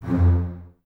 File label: BSS F 2, String Ensembles, Basses, Stacc; Miroslav Vitous; 90 s Sample CDs